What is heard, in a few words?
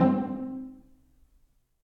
Pizzicato Strings, multisampled; Ableton; Mood Reel; Placid Plucks